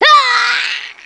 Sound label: raa